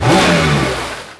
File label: start; Splashdown; XBOX